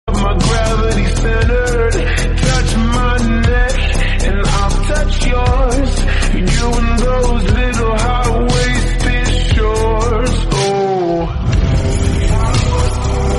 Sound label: slowed ringtone; instagram trending audio